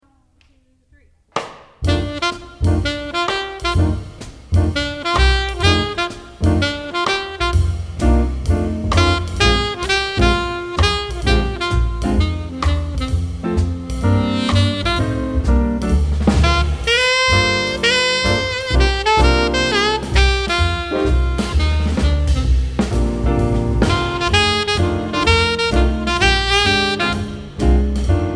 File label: Blues alto sax; All-State Jazz etude